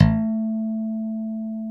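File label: A2 harm, stick bass; D-STIK BASS2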